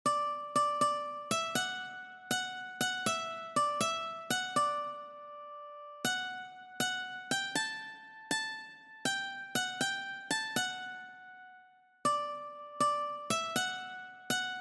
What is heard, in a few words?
Itsy Bitsy Spider One String Ukulele